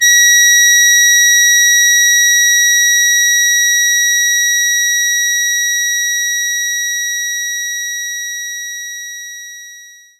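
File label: Accordian F sharp 5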